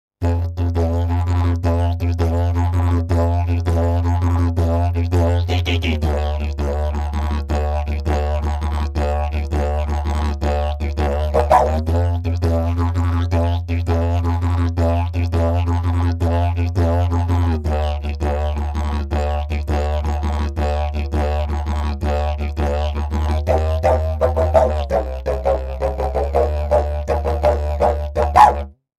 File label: didjbone ride f to dddc